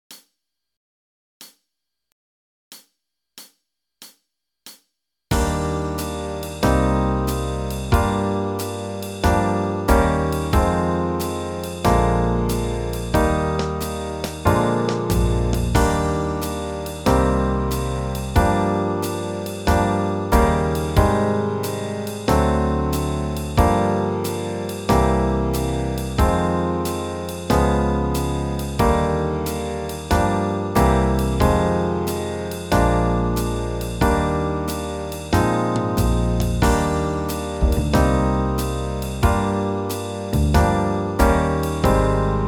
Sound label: Backing Track Eb major 92 bpm; Original Key